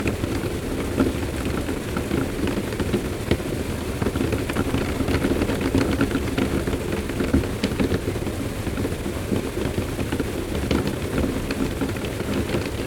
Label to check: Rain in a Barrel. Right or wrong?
Right